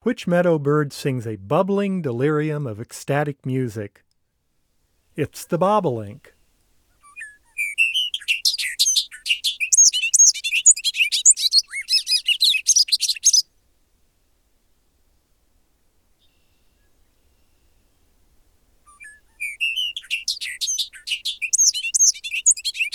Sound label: Bobolink; North America; Common Western Species; Know Your Bird Sounds